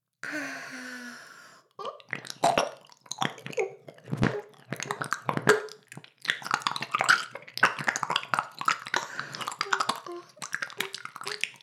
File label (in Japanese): 耳舐め音声, ASMR; ダメなメイド